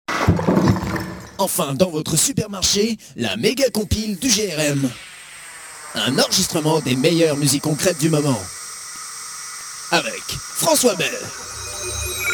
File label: jingle; grm